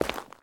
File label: zombie footstep concrete; DeadFright